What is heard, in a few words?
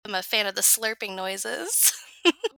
Slurping Noises